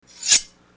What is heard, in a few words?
dagger, combat, rogue; A succubus is born